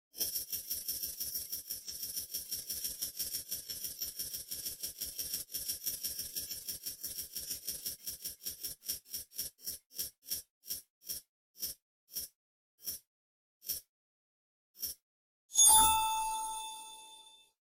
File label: spin Sound